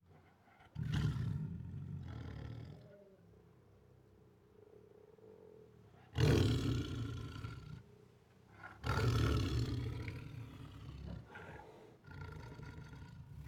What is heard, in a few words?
Siberian Tiger Protective Growls, Roars and Snarls; Tigers; Sound Ideas